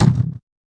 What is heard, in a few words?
tight hit; Hip Hop; STYLE KITS (Hip Hop, Etc)